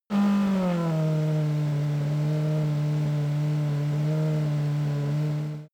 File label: racing car f1 engine decelerate idle